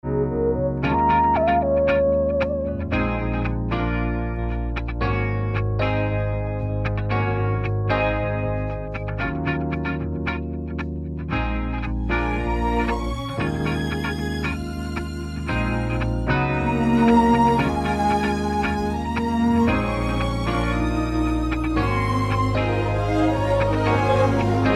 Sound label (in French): Accord